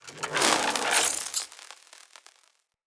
Voltaic Weapon Electricity Whoosh; Weapons; Enhancements; Elemental

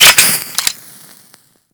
Magnum Reload Start; Warframe; Tenno

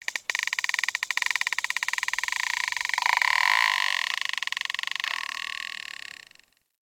Beach Tree Creak; Warframe; Grineer Beach

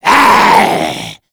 Zombie Attack; Grineer Mariner; Warframe

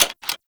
SMG Alt Mech; Warframe; Tenno; Sundial SMG; Weapons

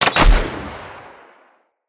Bow Shot Heavy; Sinecure

